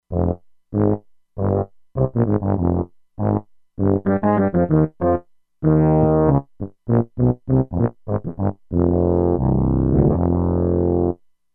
Fat Boy Tuba, E-mu Proteus-2; Factory Presets

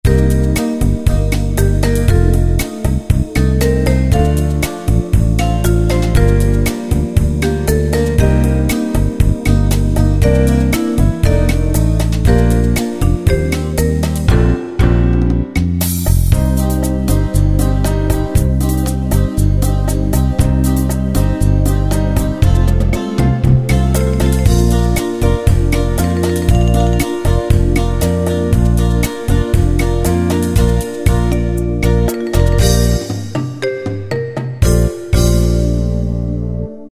Beguin, Yamaha QY70 Preset Styles

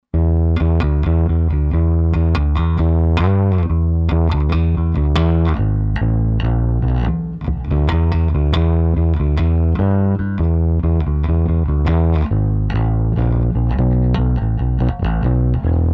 VINTAGE, Zoom 506 II

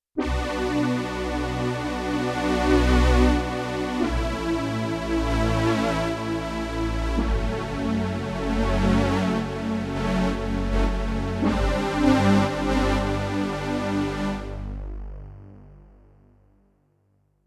fat-FiltFlow, Synth Set, Yamaha TX16W; Is that fat-FiltFlow

